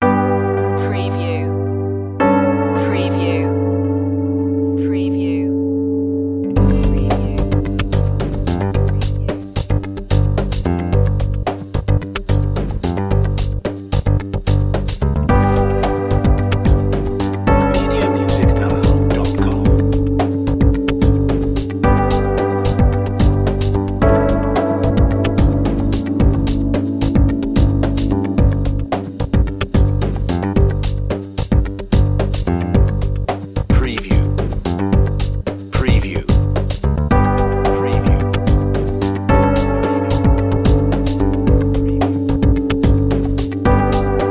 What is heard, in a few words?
new technologies no swirling synth